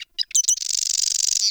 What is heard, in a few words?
Forest Bird Squak; Warframe; Grineer Forest